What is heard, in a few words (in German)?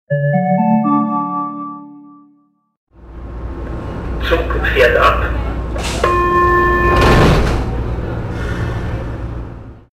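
Zug fährt ab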